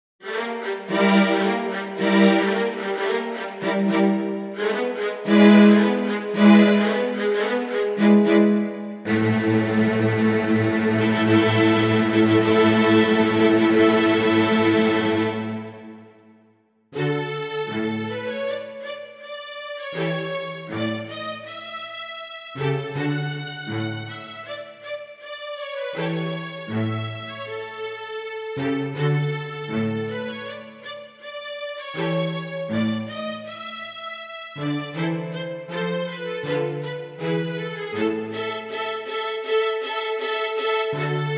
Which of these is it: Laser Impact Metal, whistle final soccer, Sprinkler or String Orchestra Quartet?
String Orchestra Quartet